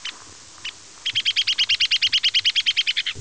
ladderback woodpecker; wood peckers

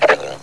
baby lion, lions tigers

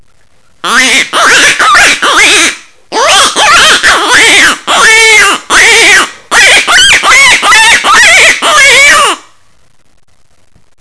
howler mouthpiece distress, rabbits

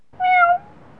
cat, house cats

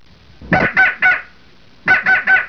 danger, crow raven

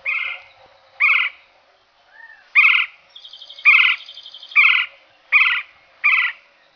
gila woodpecker; wood peckers

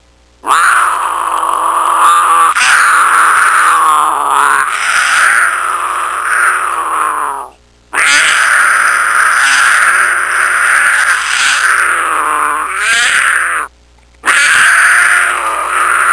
cougar, cougar puma panther

